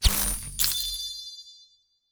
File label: gashapon